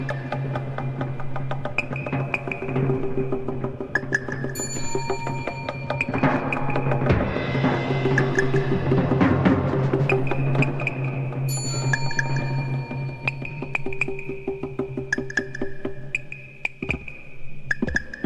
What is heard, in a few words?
Tone Float